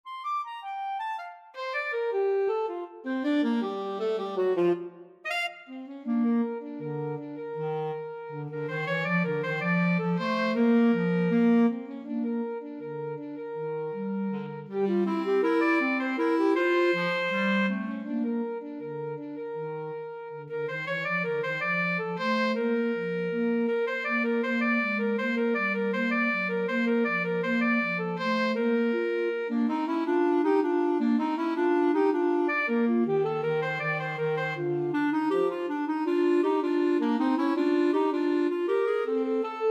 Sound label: clarinet saxophone duet